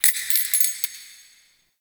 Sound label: Sparks; Warframe; The New War; Zariman